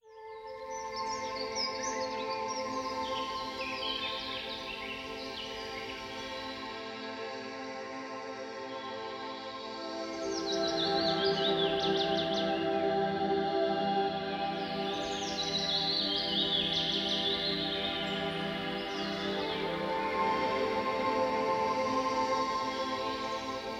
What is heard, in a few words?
Birds of Paradise Relaxation Music